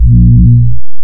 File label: crumple, Synths; Notes